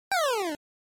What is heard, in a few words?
NES Shooter Shoot